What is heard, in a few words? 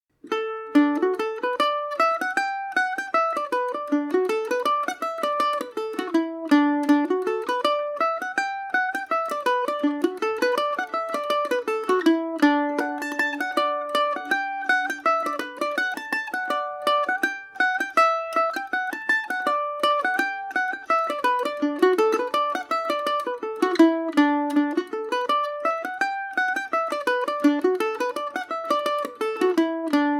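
D major, Irish mandolin; jimmy giblins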